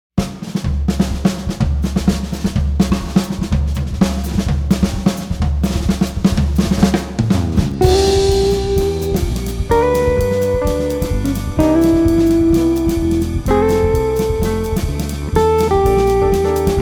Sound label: Melody